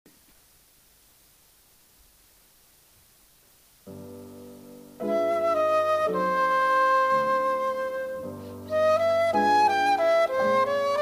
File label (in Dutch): Fantaisie, Fluit en piano